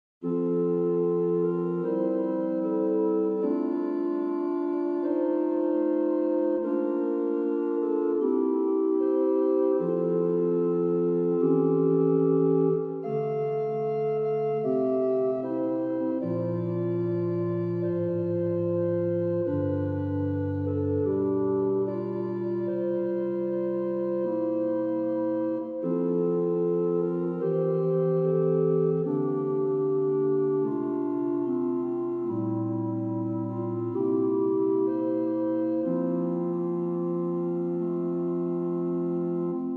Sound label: Rotary Fun